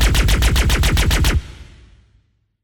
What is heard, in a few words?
fire; ships